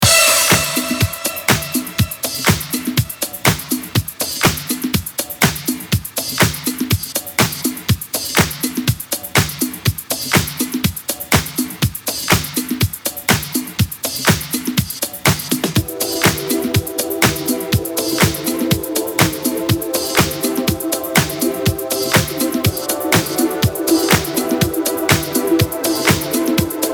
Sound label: Ext Remix Clean 9A 122 Nu Disco Funky Bass House